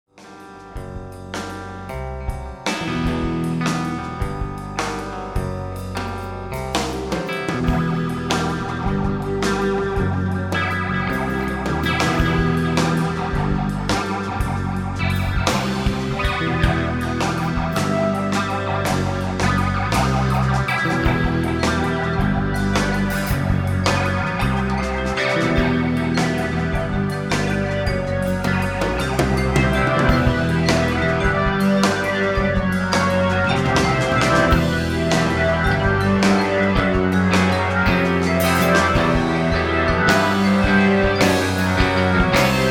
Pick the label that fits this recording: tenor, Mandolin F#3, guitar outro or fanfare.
guitar outro